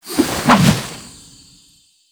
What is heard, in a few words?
glyph ball throw; Warframe; Duviri; Glyph Puzzle